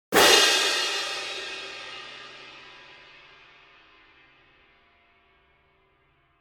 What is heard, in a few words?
Long cymbal crash